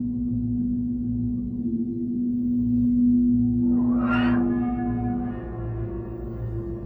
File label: SPACE SHIPS; SpaceSounds